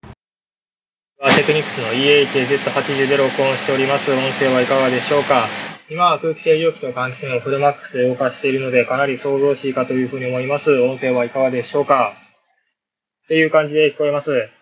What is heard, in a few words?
Technics EAH AZ80 noisy